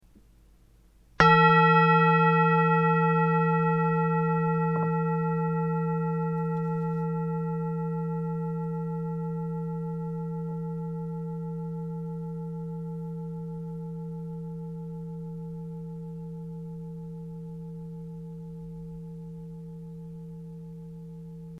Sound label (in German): Klangschale